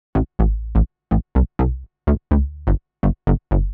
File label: SNTH BASS HOUSE 125 A; eJay